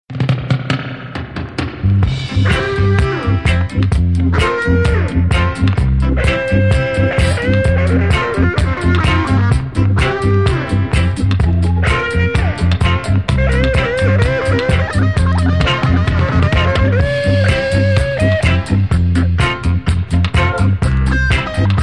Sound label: Guitar Inferno